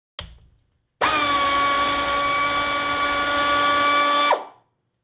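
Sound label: drill; Smeshariki Cartoon Studio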